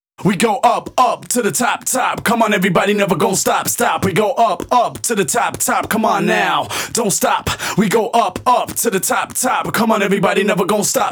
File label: Rap Vocals Chorus WET, 128 BPM; Vengeance Samples; VTDS2 Song Kits; Rap To The Top